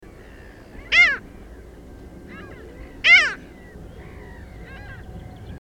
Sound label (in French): mouette melanocephale